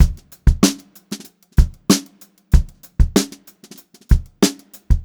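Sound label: STRAIGHT VARIATION, Funk-Groove Drumloops; USB Soundscan vol.38; AKAI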